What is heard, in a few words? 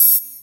HH, HIHAT